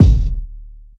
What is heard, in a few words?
big bass drum sound A key; Mono; kick drum samples